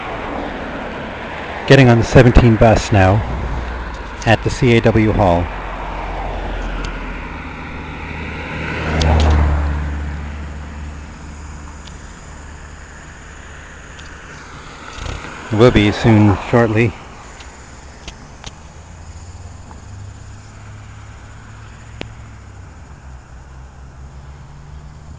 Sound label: soundscape lakeshore bus ride